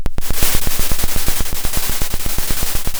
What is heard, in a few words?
Sound of a drum roll